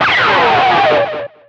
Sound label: Pickup Guitar Scrape; Pickups